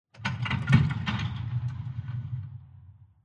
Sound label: amb combine metal press